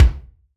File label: BD, BASS DRUMS; EdgeSounds